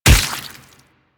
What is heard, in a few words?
Infested Splitter Gore Spew Burst; Warframe; Grey Strain